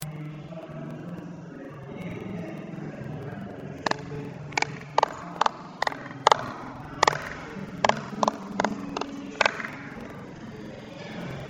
slow clap

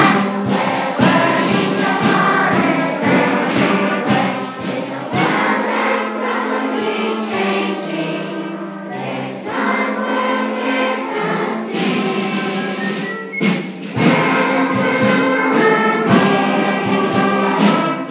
school song

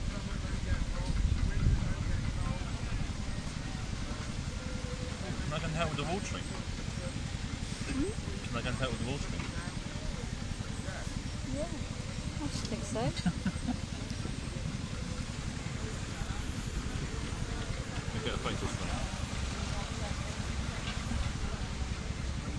sounds of steam

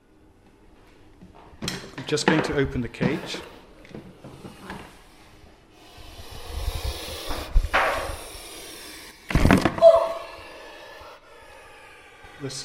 microphone attacked by puff adder

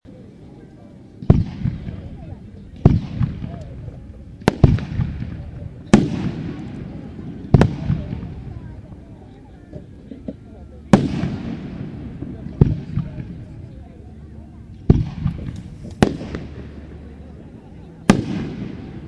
Fireworks

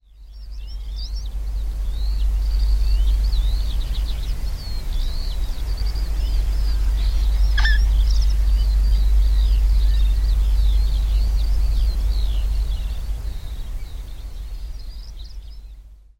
stone curlew flight call

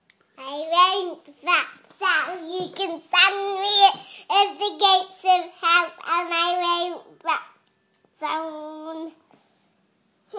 cash

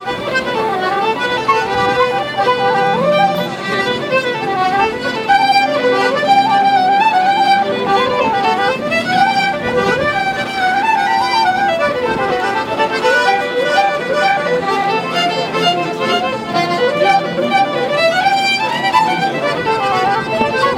dublin buskers

